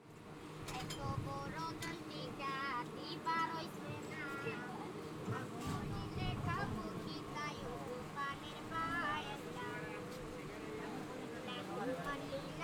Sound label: Begging song, Bangladesh; Les Cartes Postales Sonores; NOISE ON EARTH Vol2